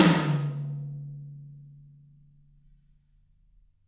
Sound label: Birch Tom Hard; Drums; apulSoft apTrigga2; Steven Slate Drums